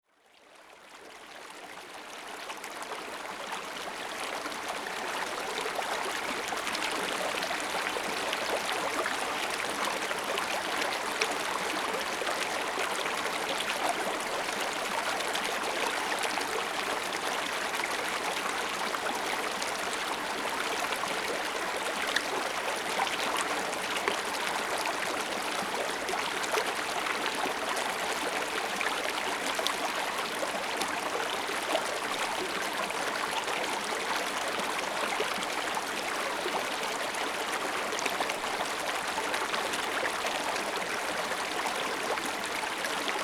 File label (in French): Ecrins La Pisse